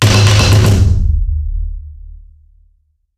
Marked for death Indicator

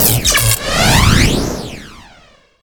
uncloak feign death

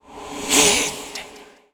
Sword hit

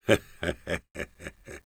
laugh evil, es; Engineer

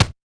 chest hit; Taunt; yeti

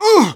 pain sharp; Medic

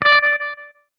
Hitsound menu note

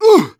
pain sharp; Medic

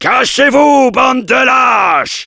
yell

